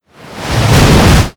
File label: wave crash hit; Call of Duty Modern Warfare